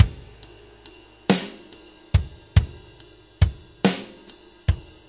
six eight Loop, Six-Eight Loops 100 to 105 bpm, DRUM LOOPS and BREAKS